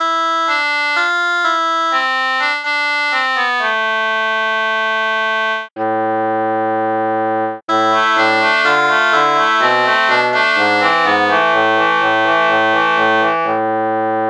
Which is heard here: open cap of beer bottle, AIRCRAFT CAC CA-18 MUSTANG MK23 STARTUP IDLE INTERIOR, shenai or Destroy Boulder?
shenai